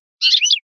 picolio, campero

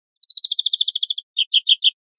gorrionada, verderon

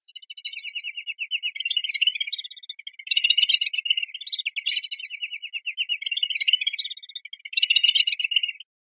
rep bailoteos, pardillo; linked files test pardillo

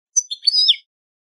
quejas chipicolios; campero

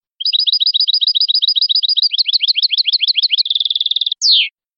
rulada

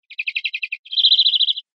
camacholada, verderon

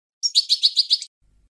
padreos corridos; campero

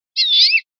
quejas mio, verderon

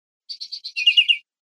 primaveras, verderon

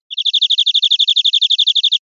golpeado, verderon

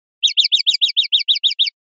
sonaja ahuecados, pardillo

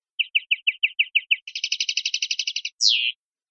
gorrion; campero

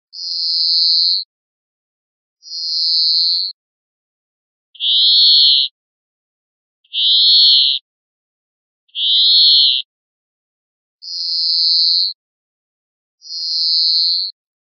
quejas giros, verderon

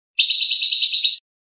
bibleos golpeados graves, campero

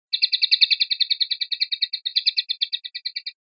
rep sonajas

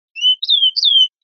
pirreos, verderon

